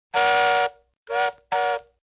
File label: toy train toot